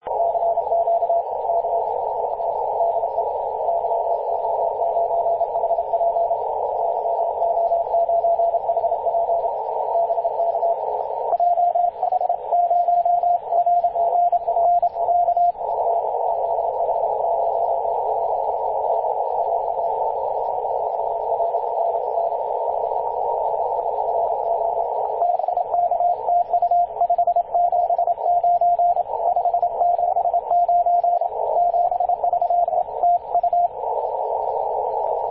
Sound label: 50.089 MHz cw; yo9hp